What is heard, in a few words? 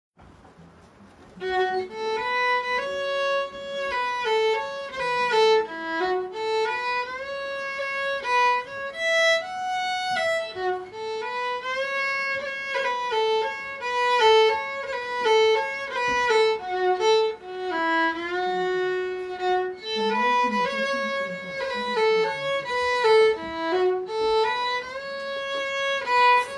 Jig